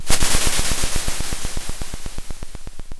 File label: Sound of a drum roll